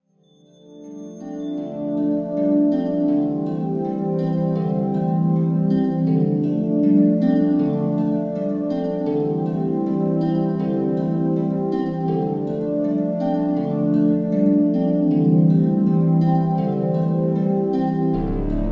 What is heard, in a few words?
Music bed - Soothing meditation w harp melody & synth pads; Hollywood Edge Sound Designers Tool Kit